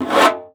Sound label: Conga; ProSamples vol.42 - Session Instruments